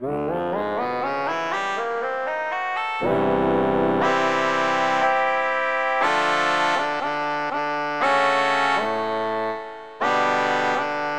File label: Bag Pipe; EverQuest